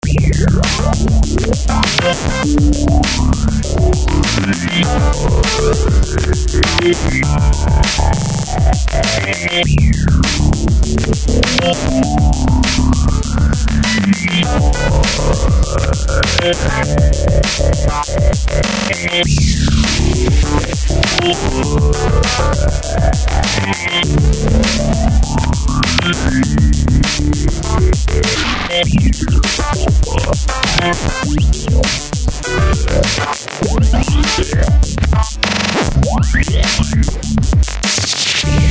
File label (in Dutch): achtergrond muziek